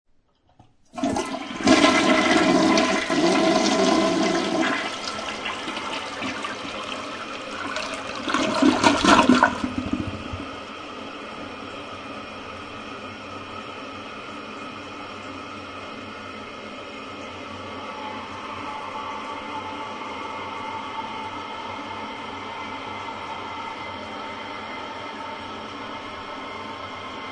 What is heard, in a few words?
Toilet Flush Run And Refill, Household, Toilet, Flush, Run And Refill; Bathroom